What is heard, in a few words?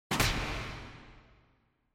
Motion Grab Reverb; モーション 掴む; リバーブ